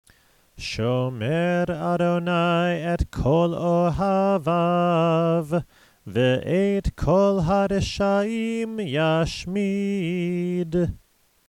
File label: ashrei